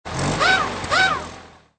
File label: Rev Long Ratchet; Guido; Cars